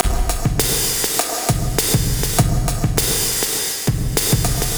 Beat, Electronic Beats; Electronica

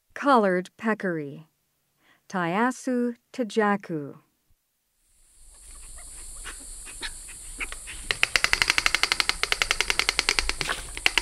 Collared Peccary; S. America

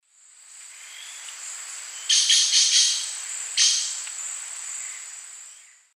Piaya cayana, call; S. America; Eastern Lowlands

